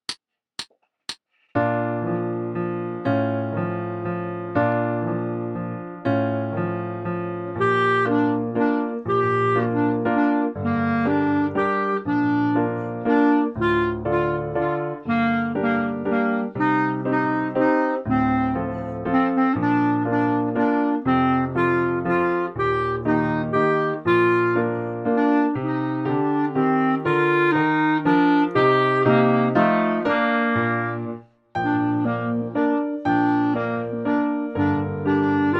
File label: Wooden Shoe Dance Clarinet